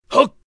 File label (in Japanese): kougeki jaku, 攻撃弱, 狂気バーサーカータイプ01; 狂気系キャラ。若干声低め。; 普段は物腰穏やかだけど切れやすい感じ。; ゲーム用フリー音声素材集